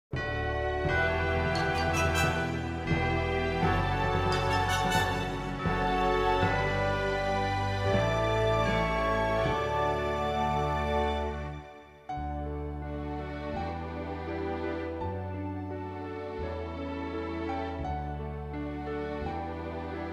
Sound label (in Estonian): hymn instrumentaal